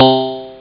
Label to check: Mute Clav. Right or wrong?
Right